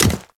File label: Step Default; Warframe; Ballas Chimera